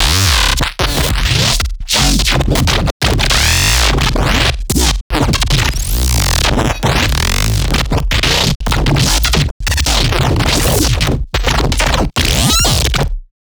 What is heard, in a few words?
Bass Shot, Robot Hell, Dubstep Basses; One-Shots; Ghosthack Advent Calendar 2021 - Day 16 - Dubstep Basses